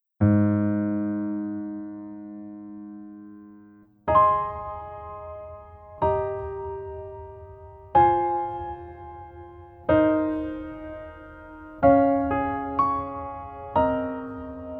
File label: Piano ver